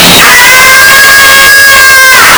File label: tom screem mega; ff2